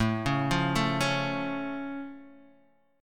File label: A Augmented A down Guitar Standard; arpeggiated; JGuitar